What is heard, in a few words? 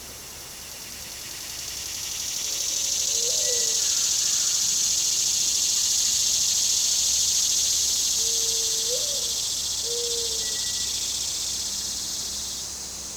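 july cicadas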